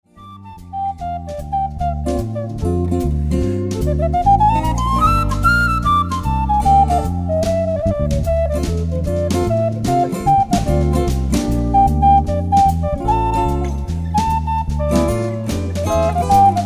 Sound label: Locomotive Blues